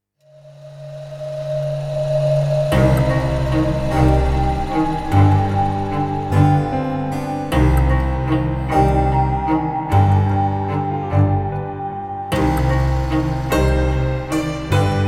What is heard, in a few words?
Clockwork Waltz; Genshin Impact